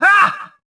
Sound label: "hah"